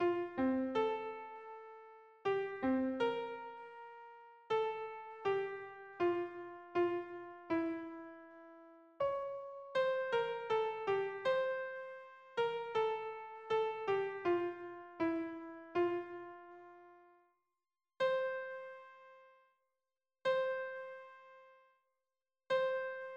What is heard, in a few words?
harmony piano